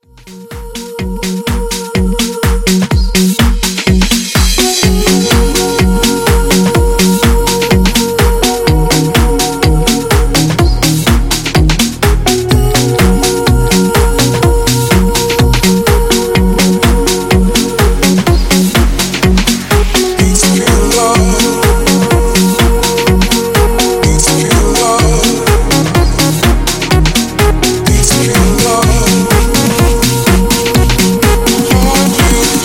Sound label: Extended House 125 Bpm Clean